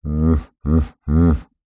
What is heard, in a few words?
Animal Mammal Herbivore Hippopotamus Grunt; animals; AdobeCC